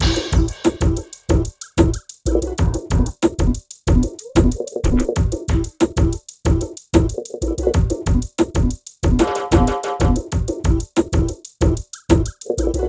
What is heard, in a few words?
BEAT, 93-96bpm; PlatinumPhattCD1; E-MU Producer Series Vol. 8 – Platinum Phatt; Beats